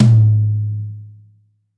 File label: Long Room Reverb Tom Drum Sound A Key, tom drum sound tuned to the A note